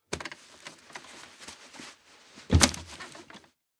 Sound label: Bag Load Grain Sack, Bag Load Grain Sack On Wagon; Drop Sound Effects; Foley; Objects